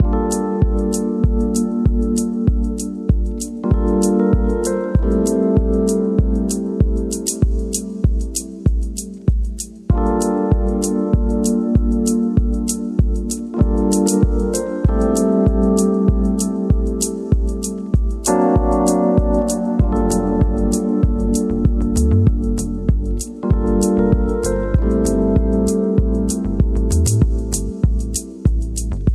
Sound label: Chillout Vibes